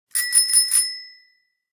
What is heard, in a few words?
bell; woodles